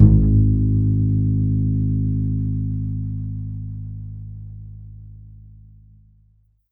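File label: double bass G#1; Univers Sons; Jazzistic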